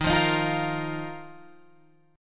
Eb Suspended 2nd, Guitar Open F; Ebsus2; strummed

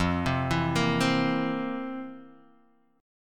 C 7th F, C7/F; arpeggiated; JGuitar

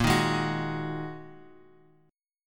Bb 7th Suspended 4th Sharp 5th Bb Bass Standard; Bb7sus4#5; strummed; JGuitar

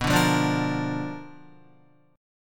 B Minor Major 7th Flat 5th Guitar; BmM7b5 strummed; JGuitar

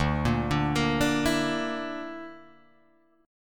D Suspended 2nd Sharp 5th D, Guitar, Open G

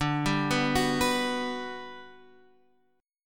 G Major 7th D

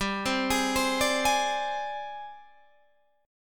G sharp Major 7th Flat 5th

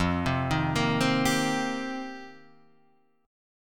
F 9th Suspended 4th, chord

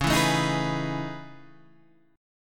A Major Sharp 11th, C sharp

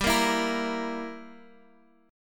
G Minor 6th G, Guitar Open G; chordshape; down

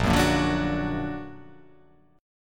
A sharp Augmented

